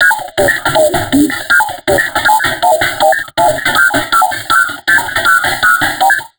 160, Drum Loops Crazy Processed; SONMOD; USB Soundscan vol.07; AKAI

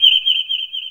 BIRD FX

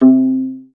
VAS PIZ C2, Advanced Orchestra; Best Service; Peter Siedlaczek's Advanced Orchestra

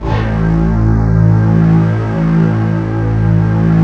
SYN DIGITAL; Sound & Vision - Gigapack I CD 2

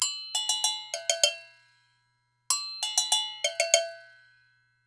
NYC Percussionworks; Sampleheads; GJ LOOPS 96

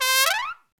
SOLO TRUMPET

